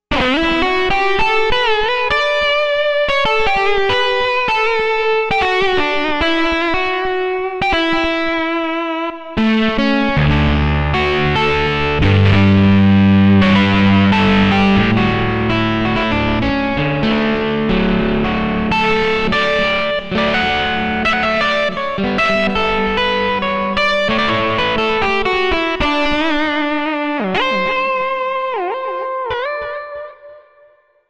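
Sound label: Guitar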